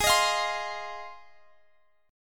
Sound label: G sharp 6th G sharp down Guitar Half Step Down, chord